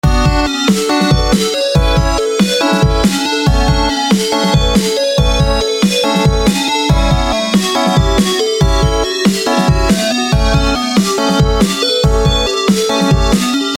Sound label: SHrEd or dead Lick